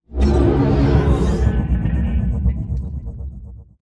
ringworld amb; Stellaris; utopia

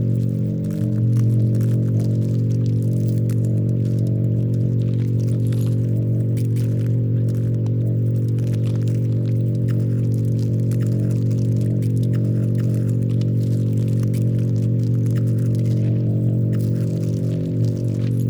pro ship construction idle; biogenesis

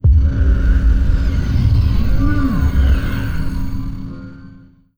event psionic; Stellaris; utopia

